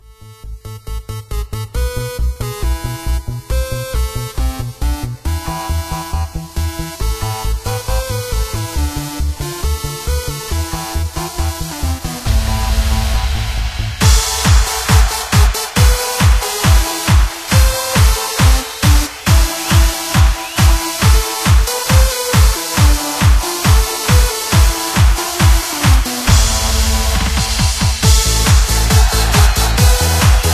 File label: Russian Dance Radio Edit